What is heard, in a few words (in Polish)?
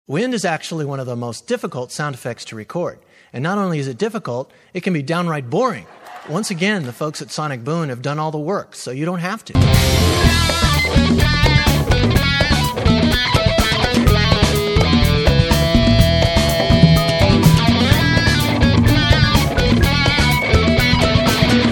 instrumentalny